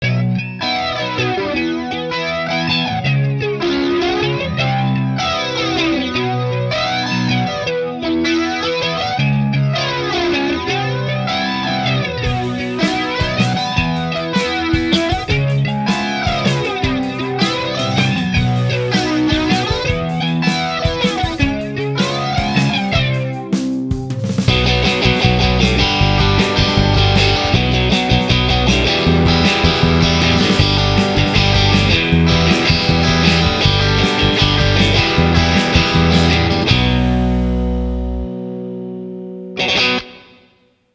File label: Flanger